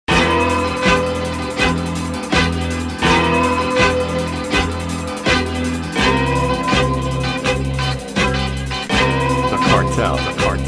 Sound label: Instrumental